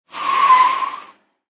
Car Or Motorcycle Brake, Tyre Screech

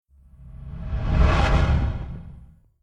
Whoosh Transition SciFi Air Lock Open Resonant; Scifi Science Fiction; Sound Design; Whooshes

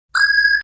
Zinger; Zingers; Multimedia

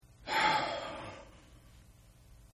Sigh; Exhaling; Sound Effects:Voice; Partners In Rhyme

